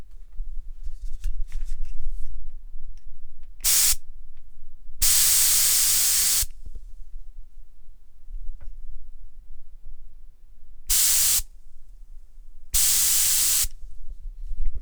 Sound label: Air Can